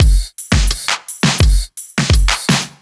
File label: Kit Wonk, 85bpm; off the grid